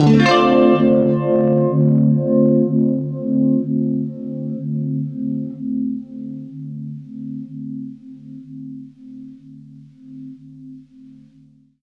UNIVIB DM7, guitar; East West; Steve Stevens Guitar Samples Collection

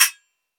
ceng ceng, cymbals gongs

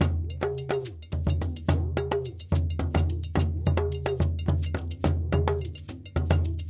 Djoun, World Percussion; Donno, Bell, Shaker

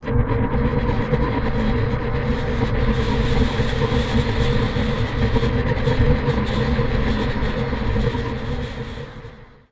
Trolley, Noisy; Zero-G Seismic Frequencies

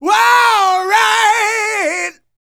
ROCK, MALE HUMAN; ILIO; Vocal Planet

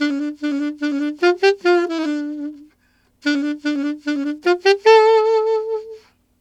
Alto Polka in D min, Alto Sax; Sonic Foundry (Sony Creative Software)

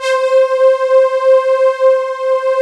C5, Synth; Big Fish Audio; Synth City; IMAGES

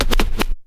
scratch fills; SCRACTH FILLS; Megabass Remix! 2

